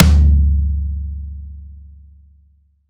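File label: RR Floor Tom, Roland BK-5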